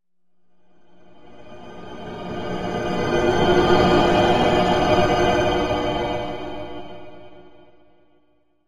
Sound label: Music Eerie Metal Ch, pads